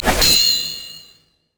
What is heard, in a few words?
dash spin; LoL; vi racer